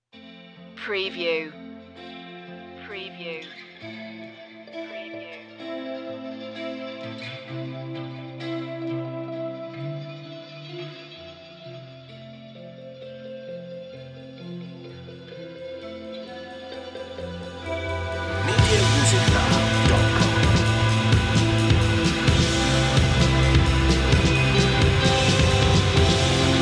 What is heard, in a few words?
wind